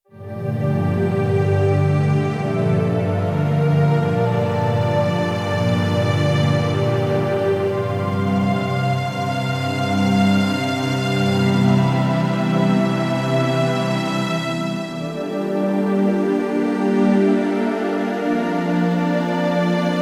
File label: Space Dream, Roland D-70; Factory Performances